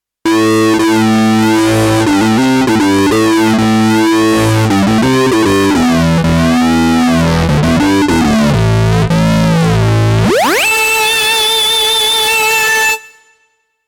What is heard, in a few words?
Solo Synth Tone